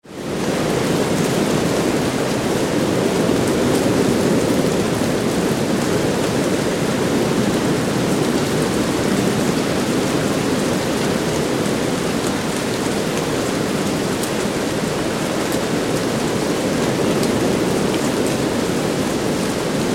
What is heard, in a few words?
Wind In Forest, Strong wind through leaves and branches; constant with plenty of foliage; Tom Hutchings